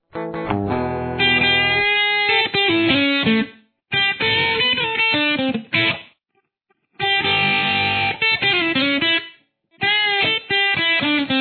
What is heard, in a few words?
solo